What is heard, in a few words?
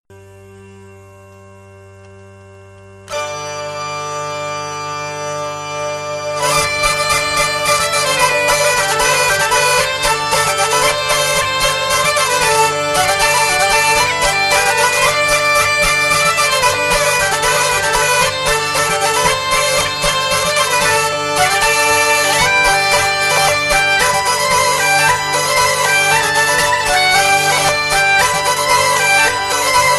polka